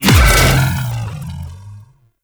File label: Consume Impact; Warframe; Grendel; Grendel Deluxe